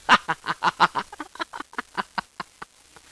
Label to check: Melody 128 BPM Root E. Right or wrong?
Wrong